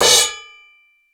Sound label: Zildjian cym 12